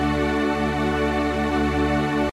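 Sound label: re major; playback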